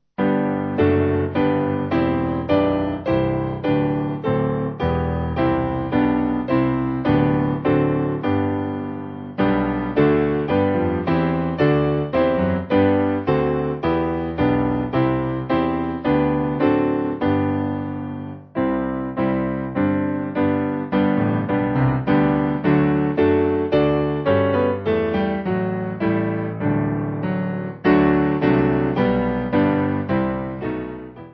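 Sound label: Piano, backing; Come Thou Fount; Corinth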